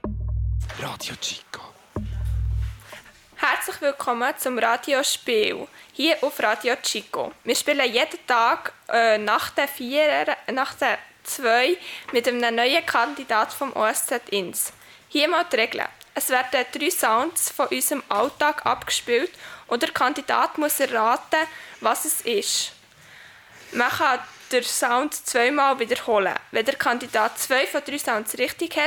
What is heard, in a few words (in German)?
quiz geraeusche; osz-ins